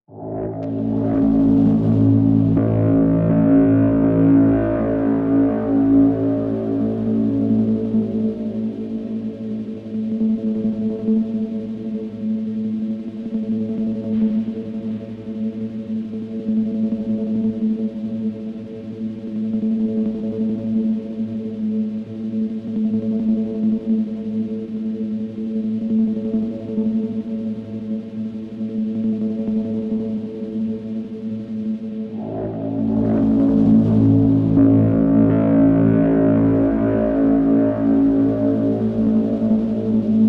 Dark Digi - Fm 60Bpm; Construction Kits; STEMS; Ghosthack; SkyRealm; Kit 04 - High Rock View

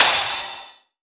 Crash, Dubstep Cymbals; One-Shots; Drums; Petrichor; Ghosthack; Ghosthack - AC2024 - Day 13 - Dubstep Sounds 2025

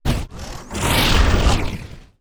wooshes transform sweetener

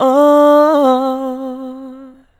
Oooh, Vocals AdLib; Ghosthack

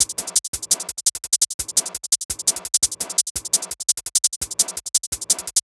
Shaker Hat 170; Drums; Loops

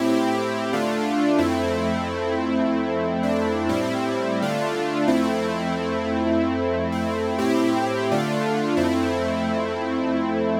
130 BPM F Min Pad, 1980s; Melodies; Loop Stems; Cymatics; GHOST (Beta Pack); Boardwalk

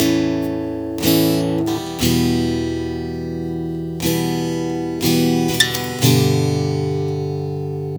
Guitar 120 BPM (A Minor), Acoustic Guitar; Nightstand; GhostHack; Free Guitar And Piano Samples

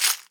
Crunch Shaker; Cymatics; PHARAOH Beta Pack; Pharaoh Premium Drum Samples (BETA)

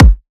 Riddim Kick

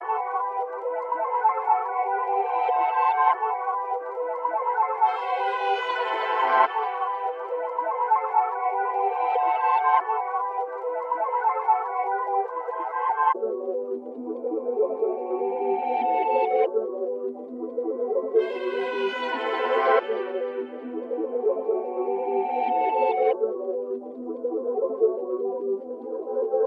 144 BPM E Min Resample, Drill; Cymatics; PARADOX II; Melody Collection; Casket

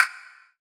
Percussion, House Drum One-Shots; GhostHack; Advent Calendar; House Kit

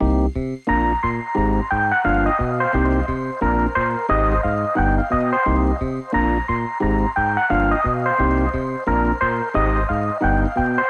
Classic Melody Loop 88 BPM C Min; Cymatics; Oracle Sample Pack

